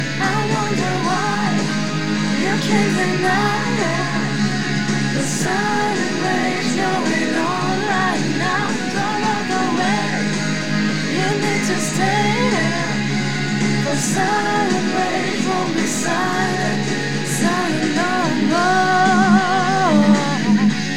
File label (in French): Chant